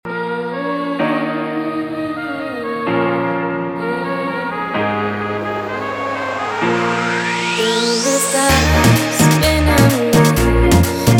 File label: intro outro 128 bpm